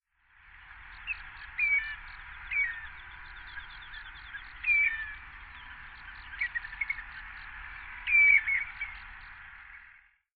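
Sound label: Common Redshank; akaashishigi; hyogo; kuroda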